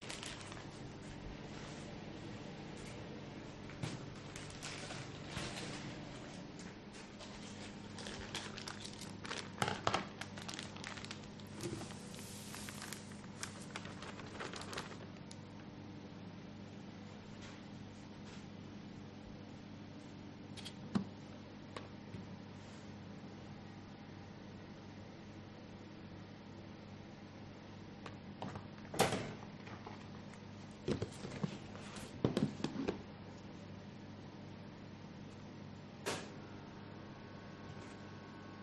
bakery food preparation with machine background noise